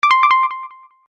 UI Confirm, User interface feedback sounds; Computers (Sound Effects:Electronics); SoundEffects-Foundation4

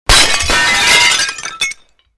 Window Meduim Smash, glass pane smashes; A series of glass pane smashes created using FMOD; Windows; Household

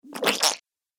Rubbery Squeaky Slimy Sound

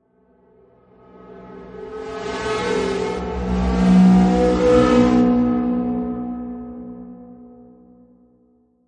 Reverse Synth Stab; Scifi Production Elements, Set 1

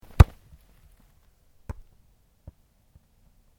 Sport Soccer Kick Big Grass, Soccer ball sounds

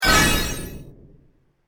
The Genie Appears, magic sound effect; fantasy sound effect

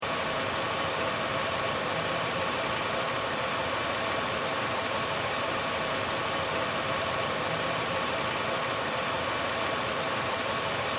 Car Mercury Sable Idle Loop, Engine Loop; Power Car sounds

